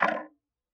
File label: Tick, Clocks Kitchen Clock